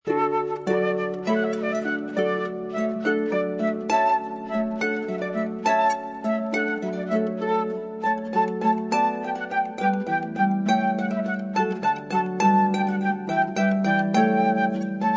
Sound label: water music allegro, harp